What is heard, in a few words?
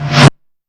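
Break Snare reverse, Roland SH-32 Drumset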